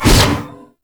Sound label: Scythe Spin Whoosh; Weapons; Warframe; Stalker Scythe